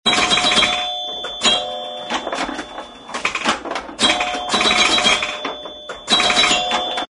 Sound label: bell pinball modern fast play